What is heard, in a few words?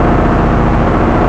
ENGINE